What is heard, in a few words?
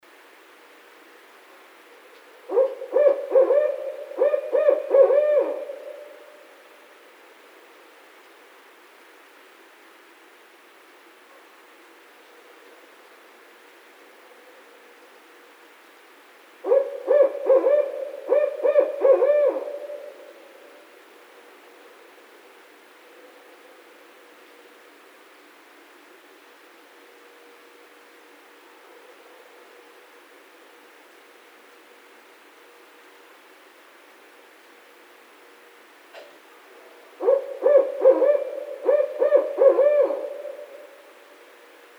Barred Owl